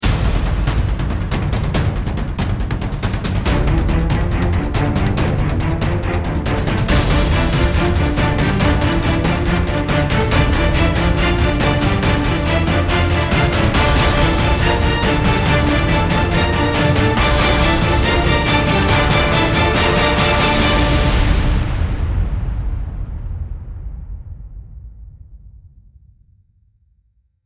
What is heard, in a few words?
relentless pursuit